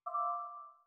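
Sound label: bell glitch C major; bespoke - Floral v.2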